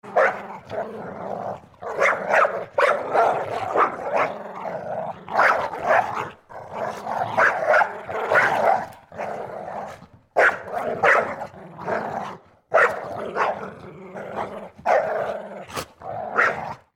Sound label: dogs fighting